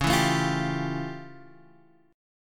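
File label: F Augmented Major 7th D flat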